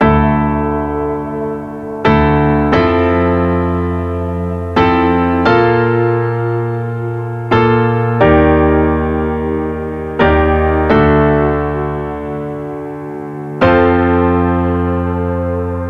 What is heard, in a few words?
Unique Chords D Maj B Min 88 BPM, piano; nikos piano sample pack